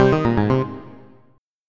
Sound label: Interesting Game Finish